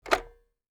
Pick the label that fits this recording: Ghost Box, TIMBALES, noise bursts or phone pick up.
phone pick up